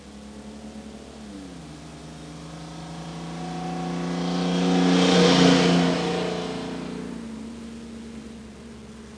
truck, busses; WAV Sound Effects; transpo

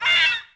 bird; DAoC

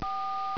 dial; 12000 Graphics Pack - A Complete Graphics Collection For Your PC

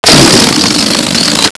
balloon; 2000 Sound Effects

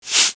Sand

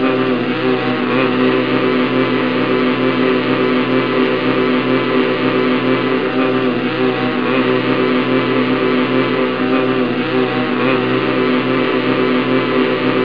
Buzzing; DR BRAIN; BUGS

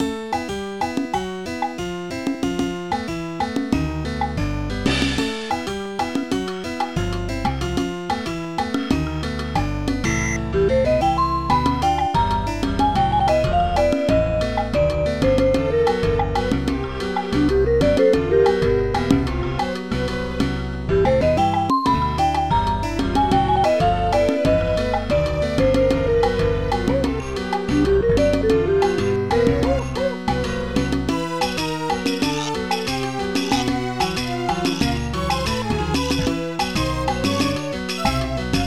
SAMBA; SoundMOD Volume 2

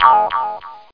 BLIP; TERACIDE

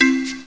bong; WWII Attack - From Pearl Harbor to Potsdam

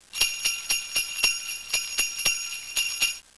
Jingling Bells; Soundsation

